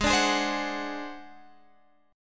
G sharp 7th Flat 5th G sharp down Guitar Half Step Down, chord